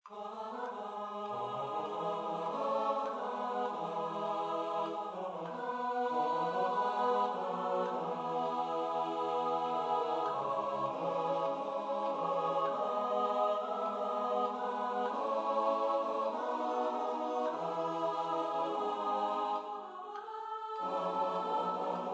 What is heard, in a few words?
SATB; Хай звучить Творцю подяка